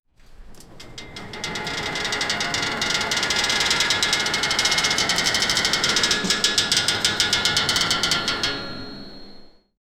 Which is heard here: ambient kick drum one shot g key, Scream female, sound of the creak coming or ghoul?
sound of the creak coming